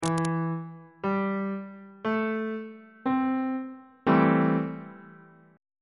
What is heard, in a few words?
c major 6th 1st inversion on bass clef